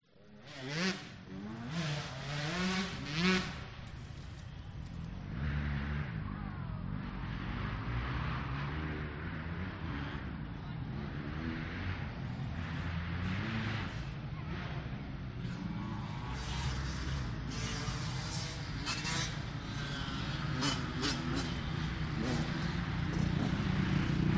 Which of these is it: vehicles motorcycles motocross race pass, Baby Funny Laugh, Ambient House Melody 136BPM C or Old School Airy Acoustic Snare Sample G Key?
vehicles motorcycles motocross race pass